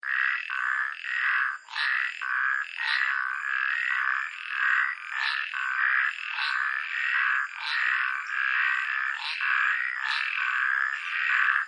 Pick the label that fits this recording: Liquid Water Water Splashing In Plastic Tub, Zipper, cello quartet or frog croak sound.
frog croak sound